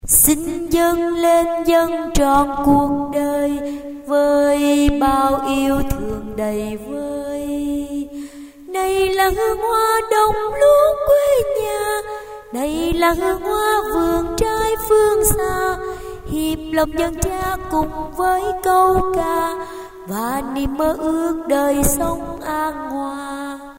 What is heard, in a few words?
tenor basso